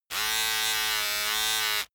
servo

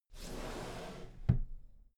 drawer cabinet equipment close soft halfway

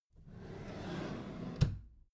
empty drawer smooth open soft

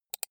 computer mouse right click soft fast

